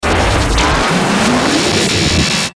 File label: Stinger Stutter Distortion